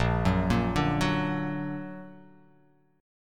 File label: Bb Augmented Bb; arpeggiated; JGuitar